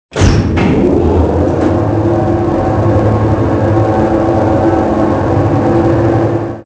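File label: elevator; Soldier Of Fortune II Double Helix; XBOX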